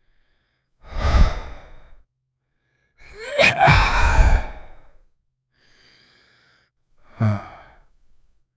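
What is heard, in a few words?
a man expressing, low growl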